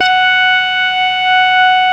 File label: SYN Morp F#6; Best Service Gigapack 1; SYN MOR1